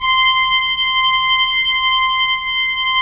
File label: ORG P. ORG; Roland - LA Composer vol. 1